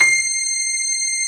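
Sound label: grand piano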